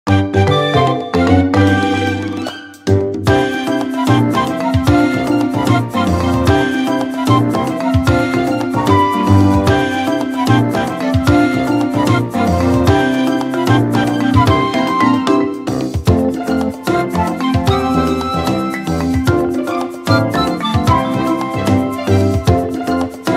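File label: Forest theme; SMM2; SMB3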